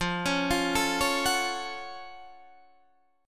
Gb Minor Major 7th F

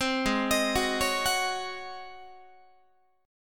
Ab 11th Ab Guitar; 15,16,18,16,17,17; Ab11 arpeggiated

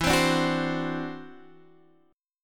E 7th Suspended 2nd Sharp 5th, E, down; E7sus2#5; strummed

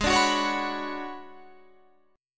B Minor 6th Add 9th Ab

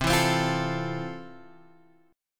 F Major 7th C

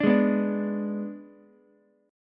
G sharp Diminished G sharp, down, Ukulele; strummed

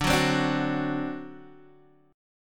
D 7th, down; D7 strummed

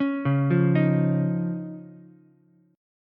C sharp Major Add 9th C sharp, Ukulele; arpeggiated; chord; 6,1,1,6; JGuitar

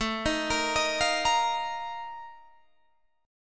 F 11th A, chord

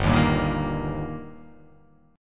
G Minor Sharp 5th G, chord; strummed; JGuitar

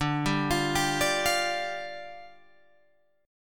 D Minor Double Flat 5th, down, Guitar, Open G

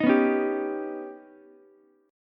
Eb Augmented 7th Eb down Ukulele; Eb+7 strummed; JGuitar

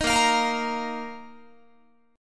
A sharp Suspended 4th Guitar; A#sus4 strummed

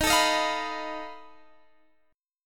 B 7th Flat 5th D sharp, guitar chord

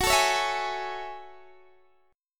Gb Minor 9th, Guitar DADGAD

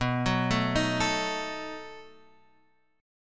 B 7th B down

